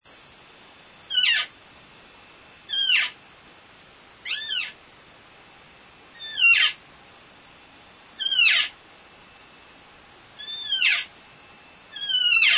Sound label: cow, elk; elk 2001